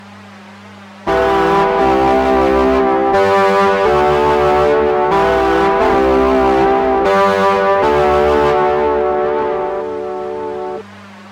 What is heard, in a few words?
piano chorus disto